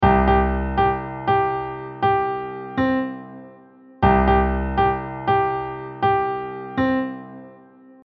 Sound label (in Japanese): スケールで考えるメロディー